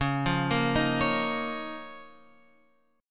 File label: Db 6th Add 9th Db down Guitar Open F; Db6add9; arpeggiated